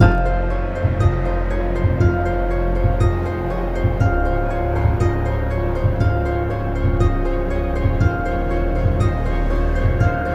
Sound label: gambler ambient; DraculasCastleWD; wazdan; gambling